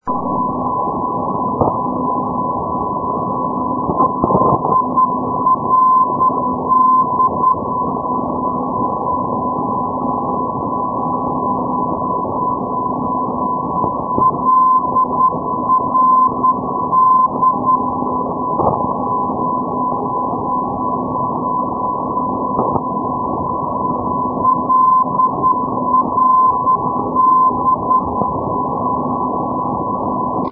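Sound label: ndb, non-directional beacon; llerida lrd-404; europe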